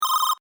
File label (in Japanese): denshion